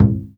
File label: HAIRY DRUM A4; Spectrasonics - Heart of Asia CD2